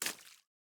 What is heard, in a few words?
water land; teardown; ps5 windows xbox series xs